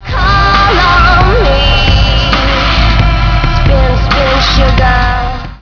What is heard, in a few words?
Electronica Exclamation; 250 Desktop Themes